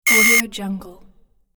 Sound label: robot whistle, game sounds